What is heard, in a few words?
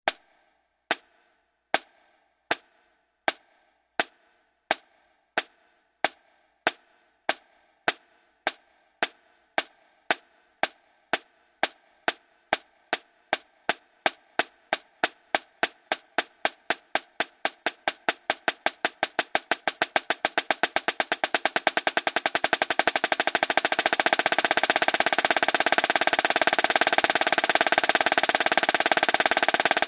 single stroke roll